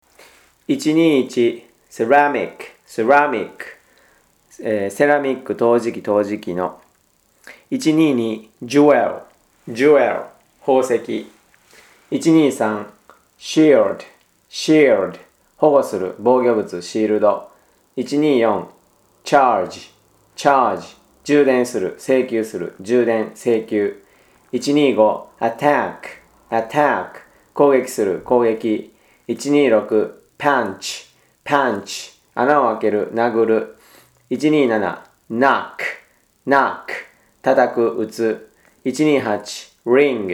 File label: tango